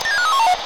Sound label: Jingle